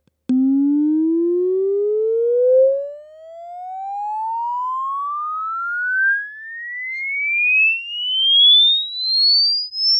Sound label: tube 150mm